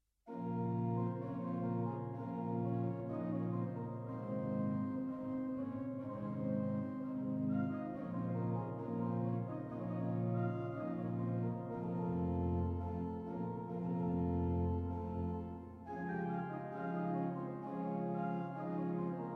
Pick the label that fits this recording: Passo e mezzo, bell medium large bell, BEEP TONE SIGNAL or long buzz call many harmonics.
Passo e mezzo